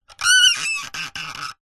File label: wood handle turn creak squeak; foley